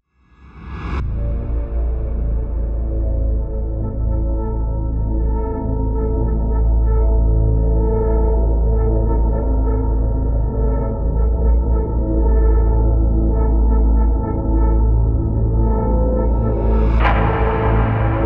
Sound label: Boiler Room